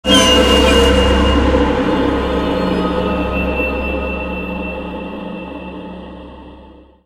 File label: scare; smokey; scp cb; 173; slender